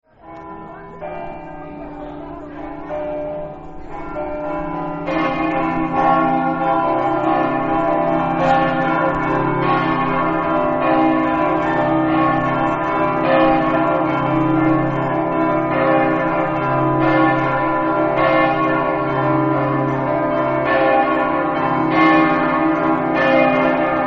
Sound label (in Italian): cividale, campane